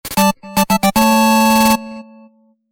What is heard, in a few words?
Get Material Fanfare (8-Bit)